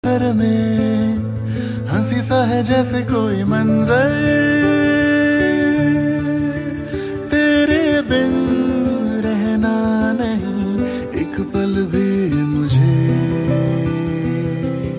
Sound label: Song Ringtone